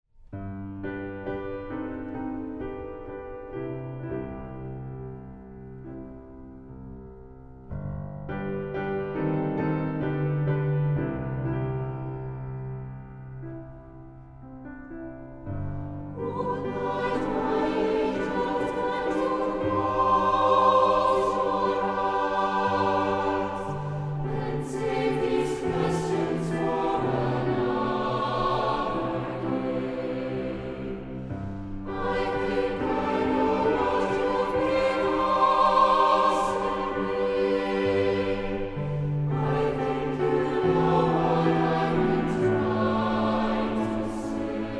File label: Lullabye